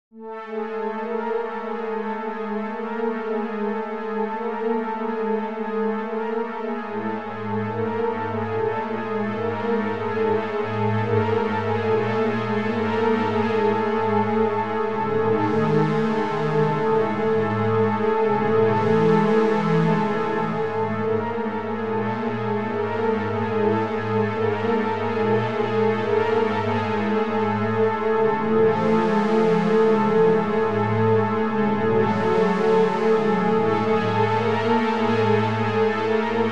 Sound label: OB Wash Ensemble